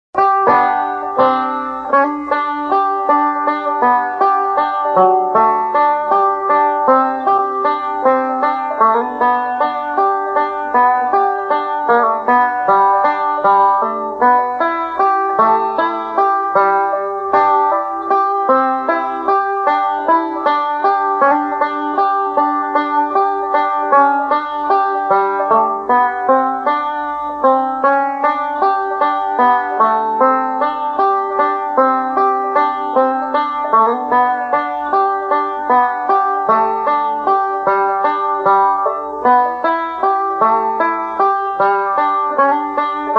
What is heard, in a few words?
Slow, banjo